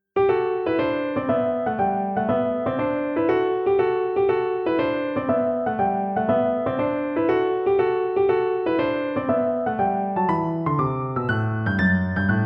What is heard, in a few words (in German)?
Tonleitern Spielen G Dur dotted; Paradiddle Kapitel 1